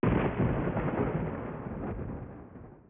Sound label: thunder